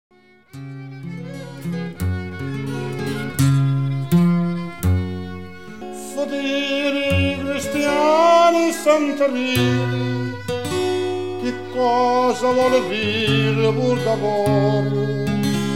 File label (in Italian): canto; DETTI MONTEDORESI